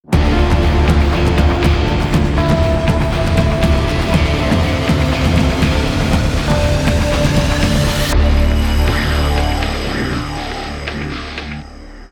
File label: Battle Rock